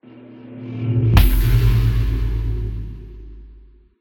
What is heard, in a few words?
Defeat Sound; Tuebor: I Will Defend